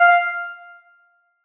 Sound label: Riddim Melodic Hit F; One-Shots; Trinity; Ghosthack; Riddim Essentials Volume 2